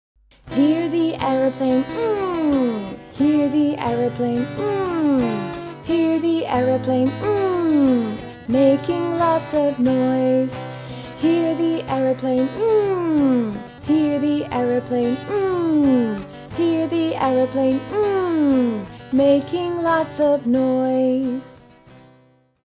N song, abc with phonics

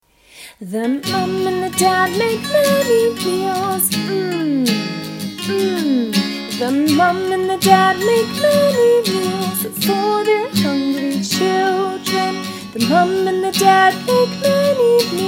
M song

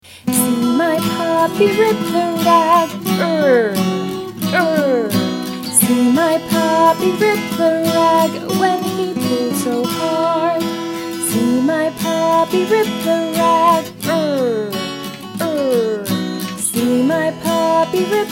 R song, abc with phonics

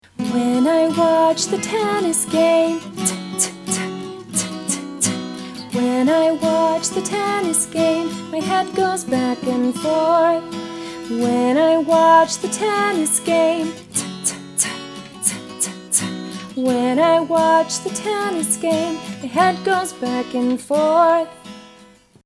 T song

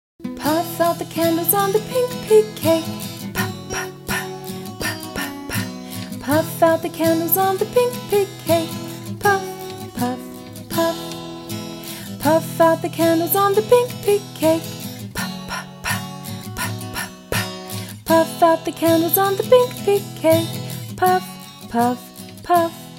P song

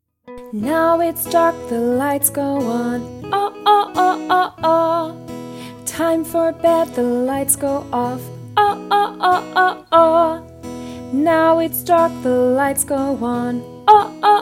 O song

